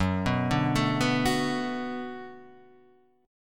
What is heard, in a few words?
D 6th Gb, chord